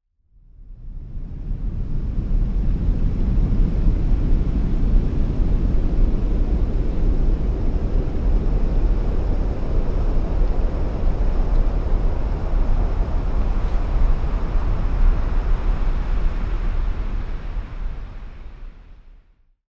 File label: Green Noise Sweeping Heavy Bass; green noise relaxing ambient audio bundle